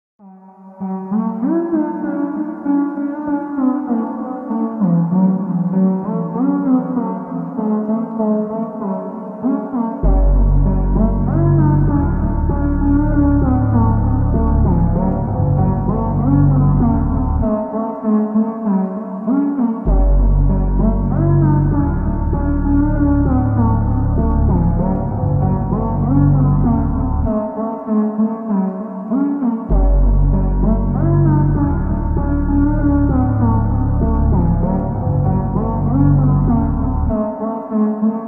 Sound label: slowed reverb no lyrics